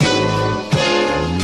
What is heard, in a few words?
horns, Loops Phonky